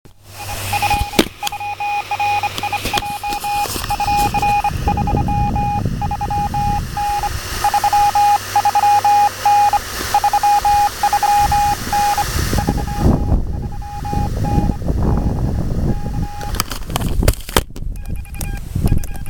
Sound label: RX Home made